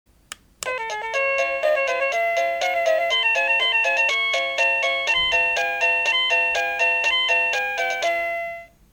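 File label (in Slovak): Bezdrôtový zvonček melódie ukážka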